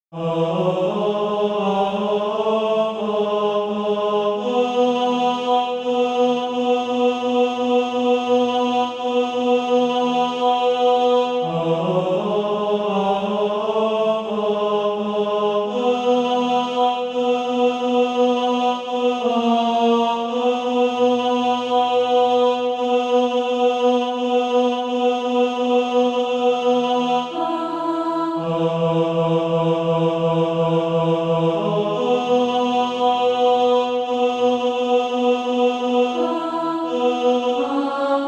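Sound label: tenor, SATB Practise Music; Do You See the Hebrew Captive Kneeling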